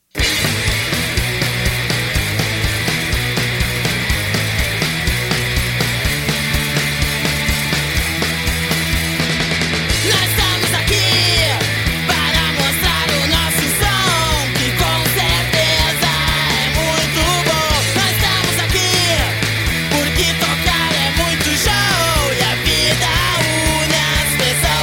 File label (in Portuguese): 3 acordes